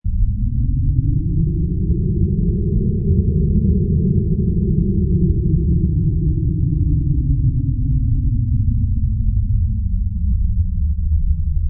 Space Ship Landing, Reverberant, muted, low frequency; space ship engine, space ship descending